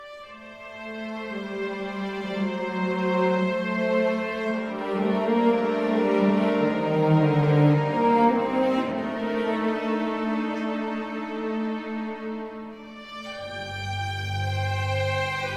Village BGM; Karnan Podiyankulam

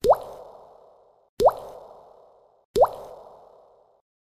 water drop

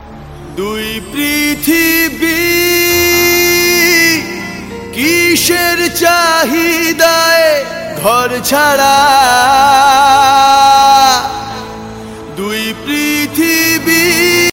Bengali Song Ringtone

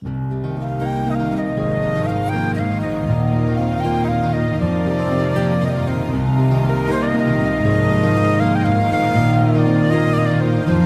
Emotional BGM

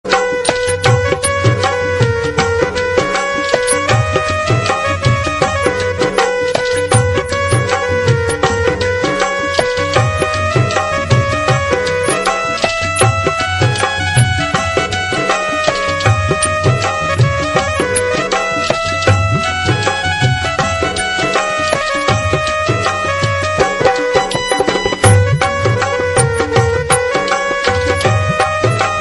rajasthani songs tone

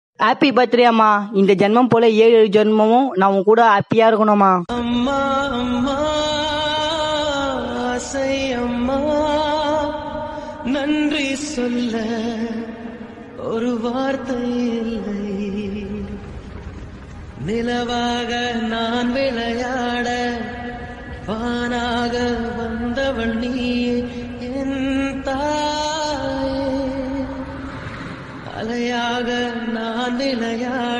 birthday wishes song; amma

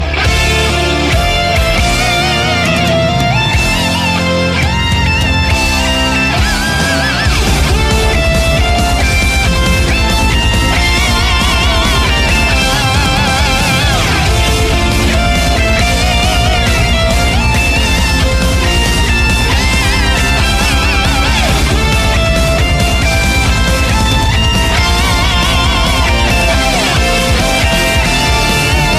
theme

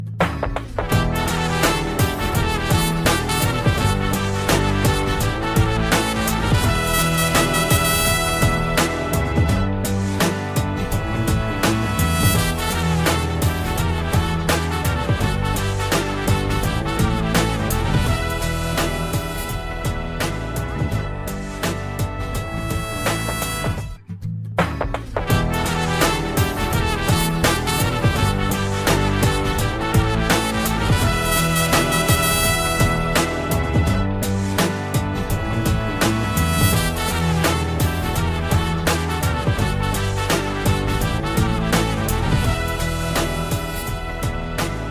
BGM; Master JD Character

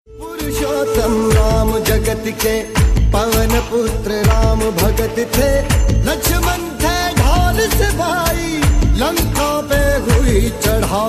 Song Ringtone

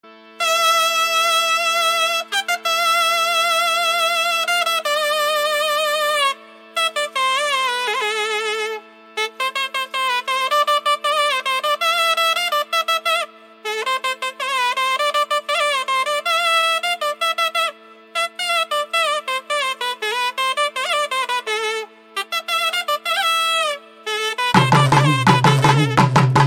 BGM; Karnan

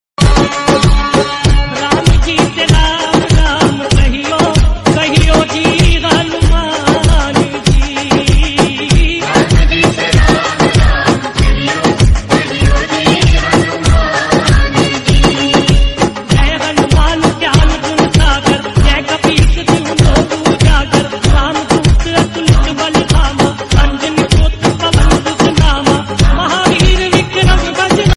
Flute Jay Hanuman Hanuman Chalisa Ringtone, flute

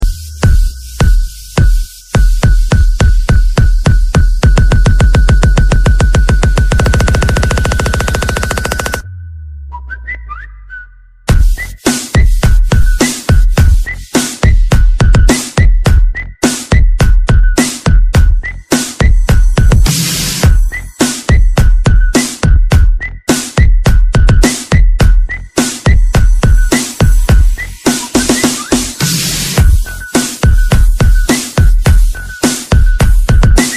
Samsung Ringtone Remix

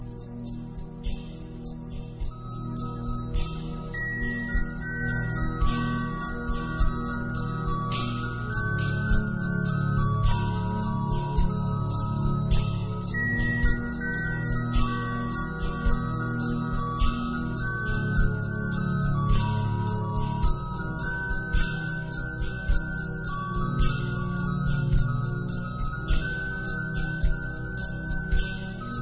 piano ringtones; airtel; android

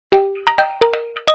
apple iphone sms

